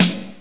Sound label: SD Rock, Drums; GMPlay; XG